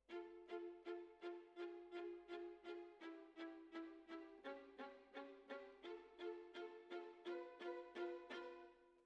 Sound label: Violas, jazz for piano